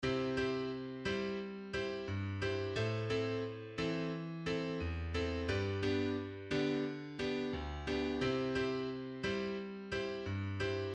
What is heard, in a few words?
rumba